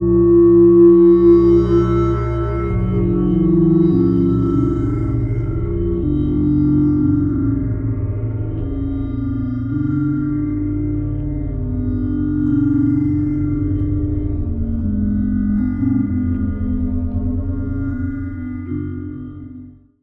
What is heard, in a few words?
Digital Ambience; Cinematic